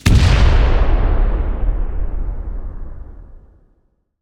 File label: explo grenade; PremiumBeat; Explosions